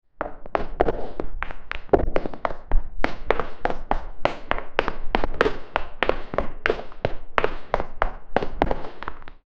only 1 right footstep

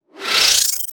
magician teleporting

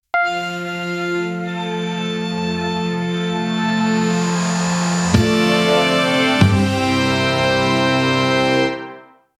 main theme for radio broadcasting

